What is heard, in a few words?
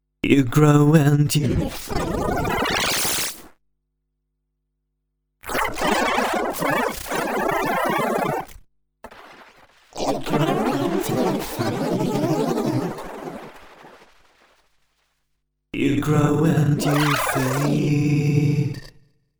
Grain Storm; WAVeBOY Industries